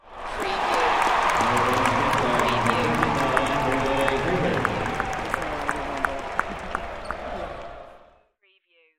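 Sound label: Arena Crowd Cheer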